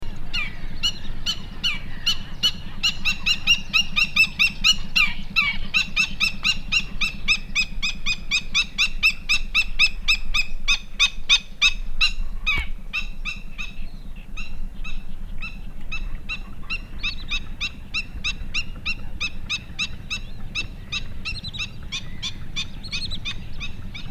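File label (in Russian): hodulochnik golos, black-winged stilt voice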